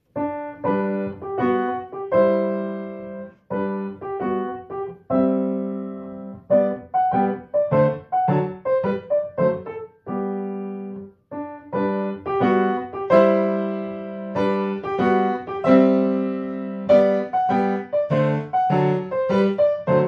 Scozzesi per orchestra riduzione per pianoforte; Scozzesi 12

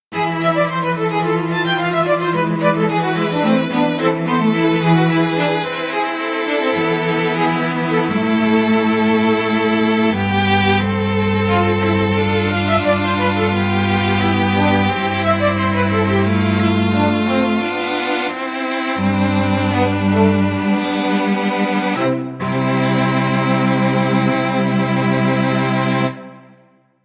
lo stesso per quartetto

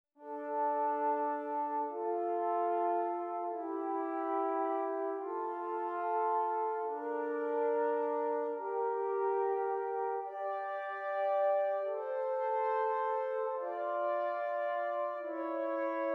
Esercizi di contrappunto a due voci; Erste Gattung; Nottebohm pagina 48

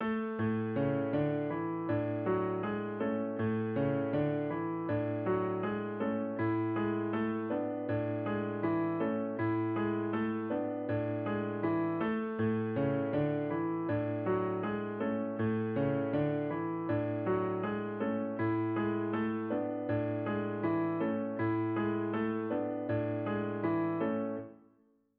Allegretto per pianoforte; revisione e ricostruzione di Graziano Denini

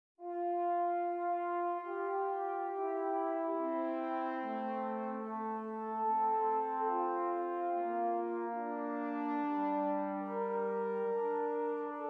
Esercizi di contrappunto a due voci; viertes Gattung; Ronge pagina 60

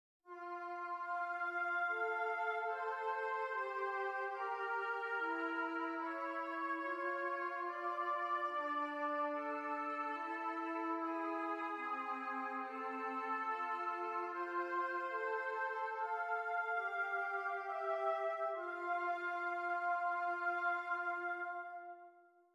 esercizi di contrappunto semplice A due voci

